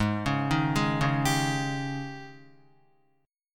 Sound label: Ab Major 7th Suspended 4th Ab down Guitar; AbM7sus4; arpeggiated